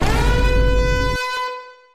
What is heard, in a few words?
siren vine boom; social credit